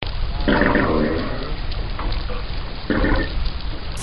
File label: person farting